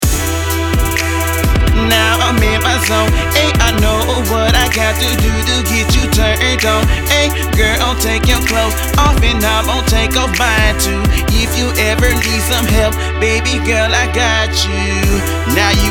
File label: single lead